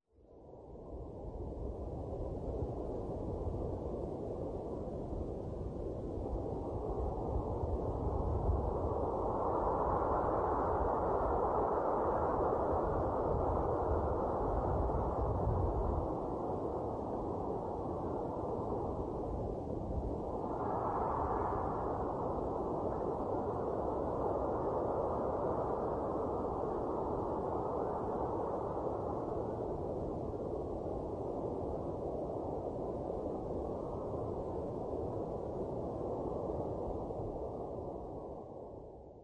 ambient wind

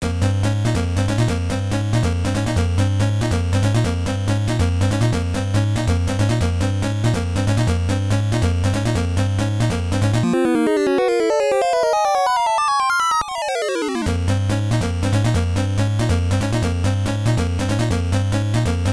Battle, Player Attack; Fire Emblem Ankoku Ryuu to Hikari no Ken

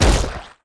sfx motivate impact; Animation Throwdown

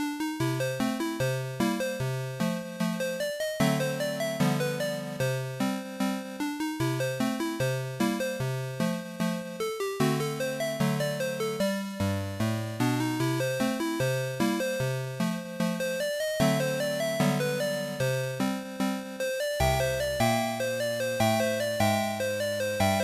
The Entertainer, Easy Piano Version; creative music system; ibm pc xt at; Scott Joplin

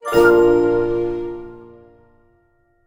Archer Steps; King's Quest Chapter 1: A Knight to Remember

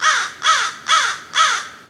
crows; CS Level3

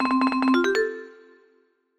Jingles; Catnip; Rat Attack

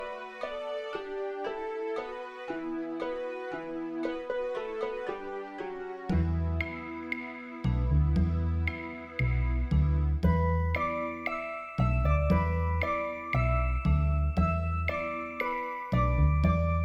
Scenario Clear; eiyuu sangokushi; turbografx 16 gamerip 1993

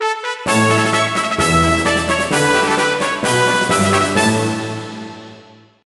Win Prize; Worms World Party

